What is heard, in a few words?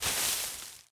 leaves step; Golden Crayon Sounds